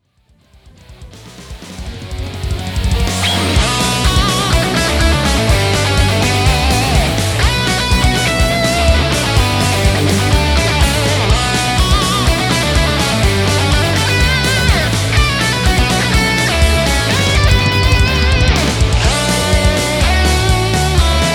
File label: Rock Metal